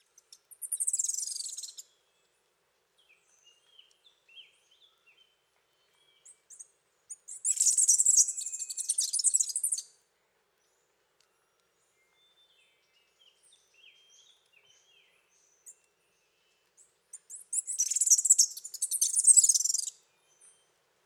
Calls and songs, Birds of the Costa Rican Cloud Forest